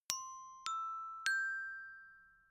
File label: notification glockenspiel triad up major